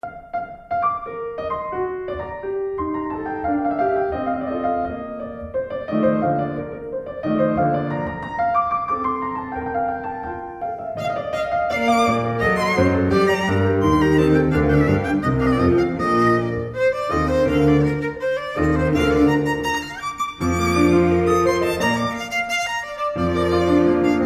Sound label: piano trio allegretto